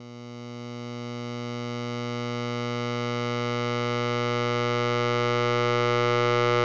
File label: cylindrical Waveguide